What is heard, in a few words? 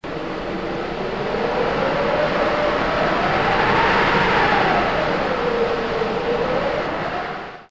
Wind